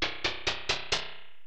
internal stairs up; zsdx

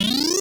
menu expand; project hawk

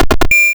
helicopter spawn; tanks of freedom; units

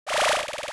acorn growl; project hawk

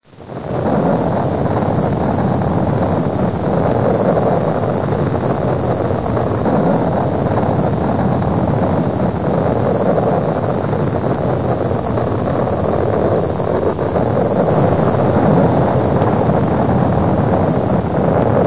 crash; race-into-space

